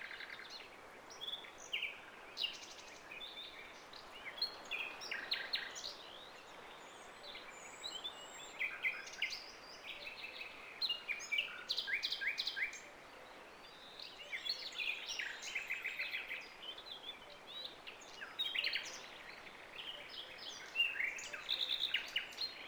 birds no battle; WAR

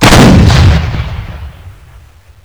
tiger cannon fire; WAR

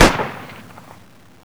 30cal; WAR

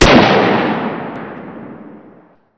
kar98; WAR

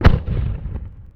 distant cannon; WAR

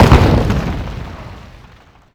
medium impact generic; WAR

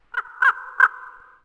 raven; WAR

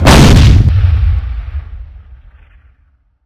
sherman gun; WAR

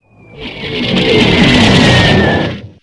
shell; WAR

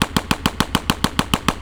m3 loop; WAR

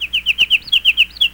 kinglet; WAR

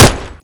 30cal; WAR

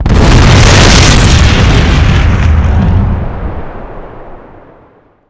vehicle death explosion combo; WAR

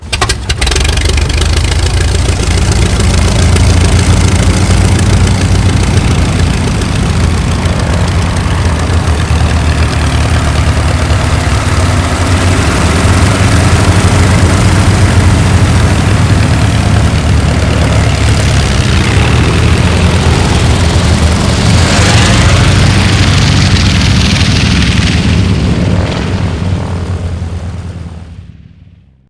Henschel taxi; WAR

